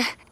Grunt; Lewd SFX

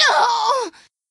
Short Scream; Lewd SFX

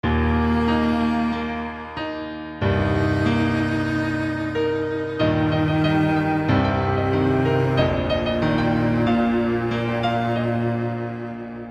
Semi orchestral version

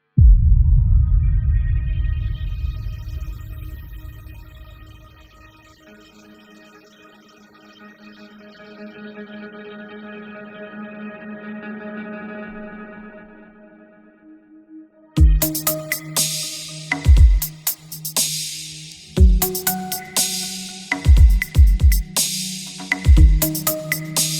Late night Ambience

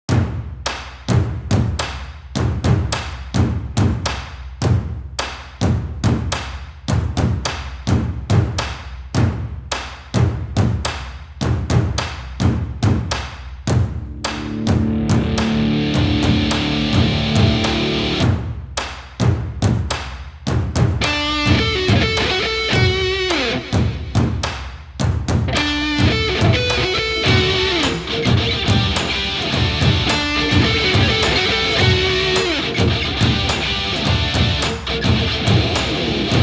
(instrumental)

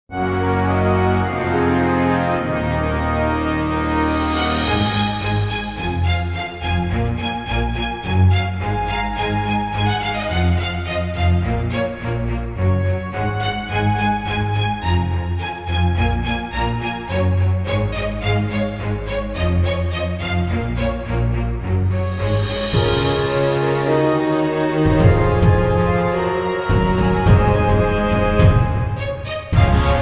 Orchestral Arrangement

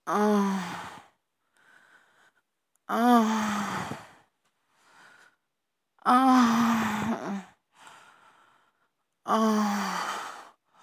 Moans

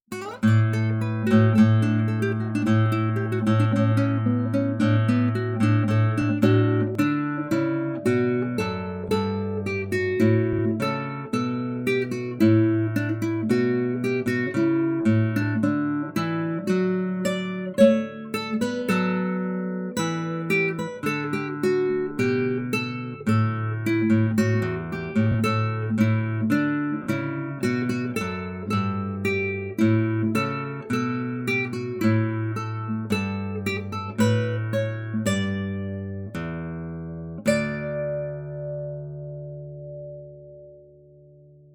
Carol Classical Guitar

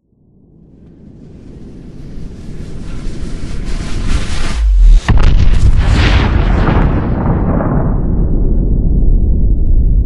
Explosion Nuke Shockwave; War Ambience; NukeChargen

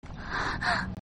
Breath; Lewd SFX

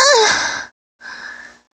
Moan

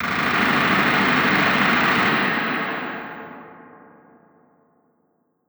city battle

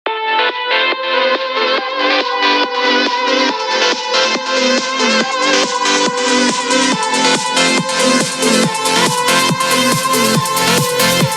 Violin Melody; Sundown TEASER

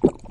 Swallow; Lewd SFX

